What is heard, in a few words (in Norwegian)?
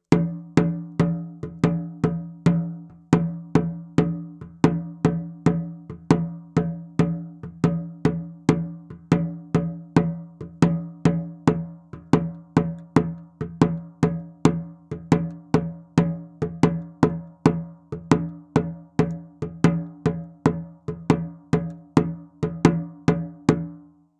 SOUND, sjamantromme med geiteskinn og treformet handtak; sjamantromme med geiteskinn og treformet handtak 40 cm